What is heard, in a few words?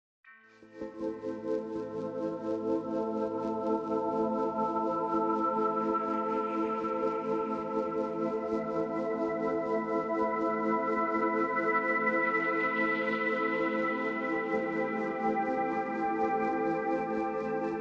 Synth Pads